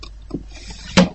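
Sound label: drawer pushed shut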